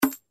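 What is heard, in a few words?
button choose coin minus; Zodiac Millionaire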